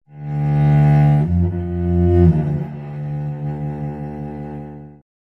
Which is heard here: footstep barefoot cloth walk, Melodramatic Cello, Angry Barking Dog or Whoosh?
Melodramatic Cello